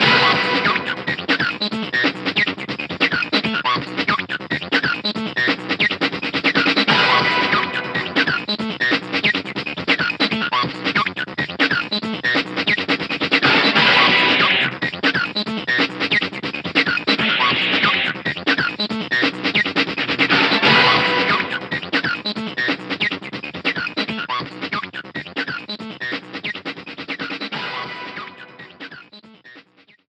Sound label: BoomBox loop